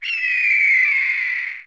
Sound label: hawk call